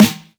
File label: Snare Vinyl, drums one shots; Ableton Live 12 Lite; Core Library